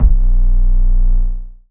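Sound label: Distortion 808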